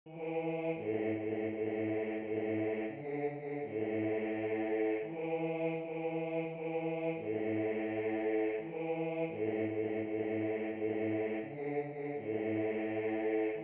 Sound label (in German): Jetzt fangen wir zum Singen an Bass II